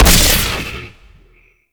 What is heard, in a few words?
Amplifier Chassis Fire; Weapons; Operators Amp Chassis Part B Fire B; Warframe; Amplifiers